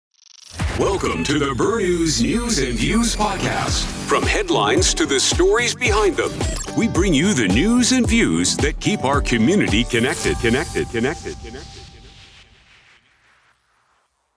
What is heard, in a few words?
podcast intro; BNV Audio